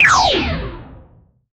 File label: a short laser shot